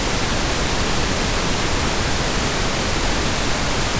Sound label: rcv pink; xiaomi rodin